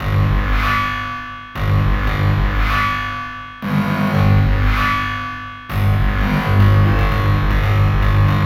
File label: Robo Beat, Percussion